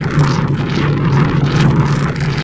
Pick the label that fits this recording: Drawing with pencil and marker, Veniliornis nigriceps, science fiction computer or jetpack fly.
jetpack fly